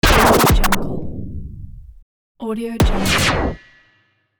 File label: accented scratch